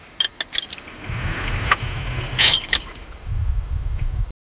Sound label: the sound of a wrecked, damage